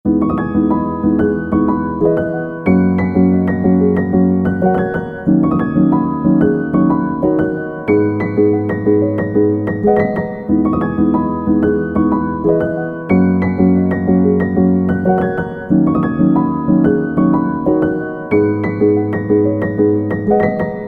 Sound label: Loop Soft Piano